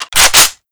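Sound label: weapon foley mp5 reload chamber; Call of Duty Modern Warfare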